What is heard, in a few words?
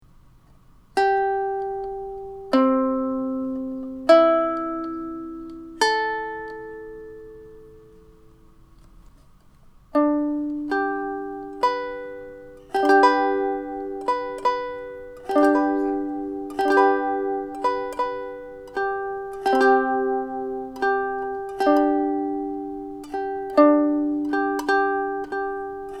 Sycamore walnut, ukulele